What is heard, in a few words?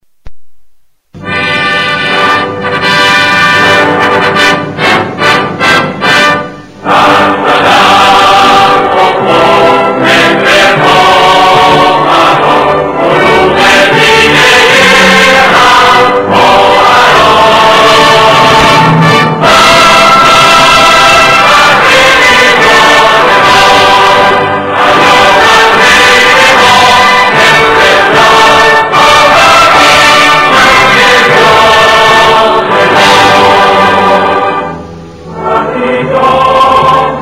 National Anthem of the Islamic Republic of Iran